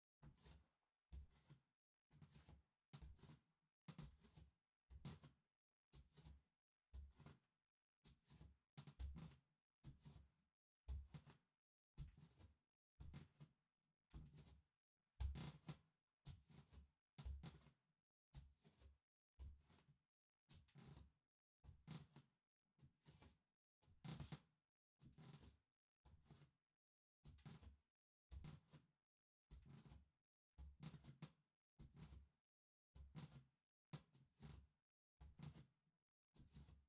並んで歩く 低速, 裸足 足音; KU100

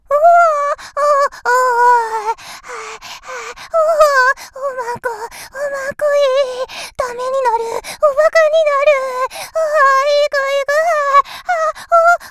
んおぉっ おっ おっ ほぉっ

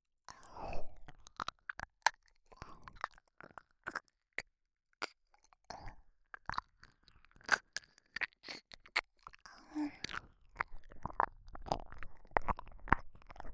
バイノーラル 耳舐め ループ

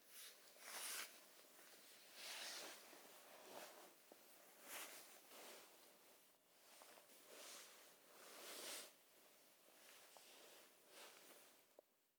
衣擦れ右から背後を通って左へ; 動作系

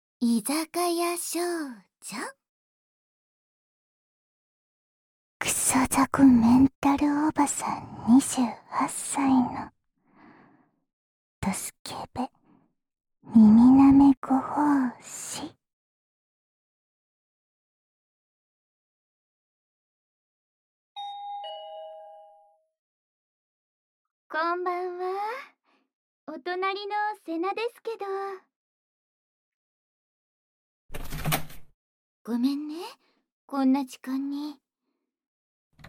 耳かき, ASMR

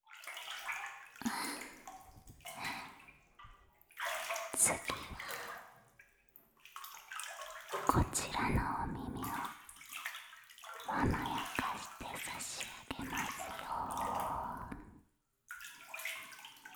『寝湯で耳掃除（左耳）』, ASMR

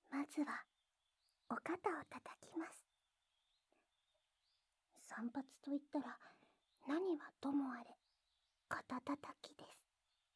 何はともあれ肩叩き; 二日目・散髪

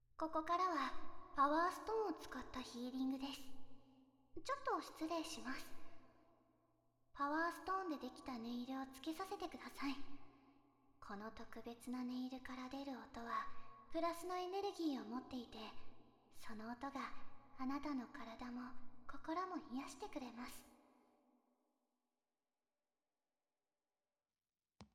ネイル（パワーストーン）でヒーリング, ASMR

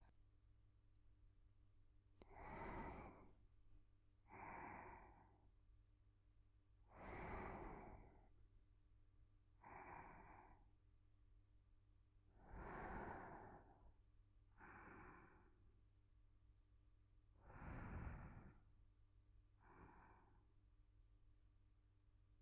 寝息; スキ×キス ご主人様が眠りに落ちるまで耳元で好き好きちゅっちゅしてくれるメイドさん; DL製作班

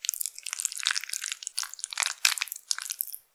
きつきつに無理やり, きつきつに無理やり入れている風の音; 挿入or引き抜く

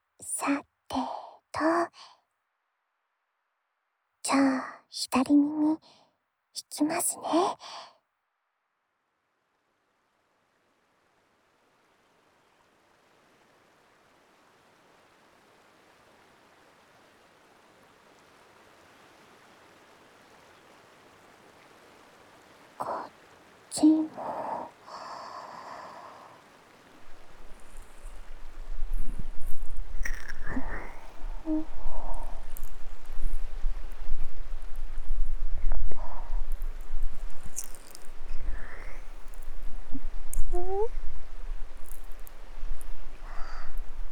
清流船上耳かき（左耳）

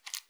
深めに ストローク, ピストン運動 激しめ; １ストローク単発

